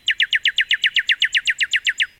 bird red; minecraft; technic

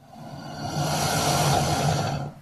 croc grunt; minecraft; technic

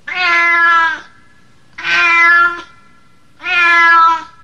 kitty trapped; minecraft; technic

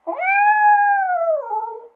kitty dying; minecraft; technic

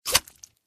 scorpion sting; minecraft; technic

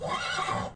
horse hurt; minecraft; technic

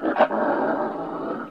werewolf dying; minecraft; technic

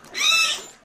dolphin dying; minecraft; technic

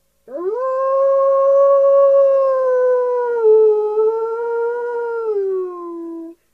werewolf grunt; minecraft; technic

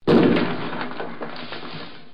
destroy; minecraft; technic

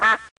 duck; minecraft; technic

